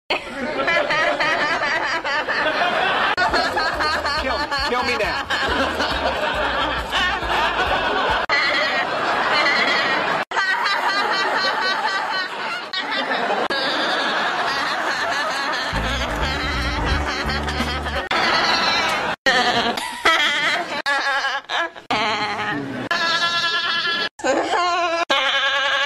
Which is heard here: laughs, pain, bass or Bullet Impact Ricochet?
laughs